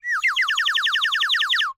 Bird whistle, clay, painted, water, airy, wispy, phrase, excited, happy, rapid, short; Eiravaein Works; Vaeyan IV